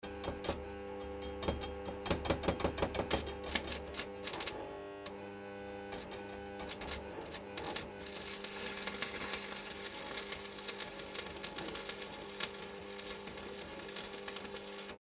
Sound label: welding electrical